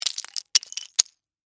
Crash Ice Debris, Crash, Ice, Debris, Drop, On, Ground; Materials